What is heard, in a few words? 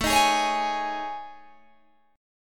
A Major 7th Flat 5th, Guitar Open G; chord